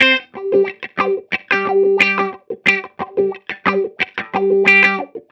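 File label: Hip Hop Guitar G major; Digidesign; Pro Tools; Pro Tools Essential Loops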